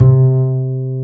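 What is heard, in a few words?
double bass C3, Jazzistic; Univers Sons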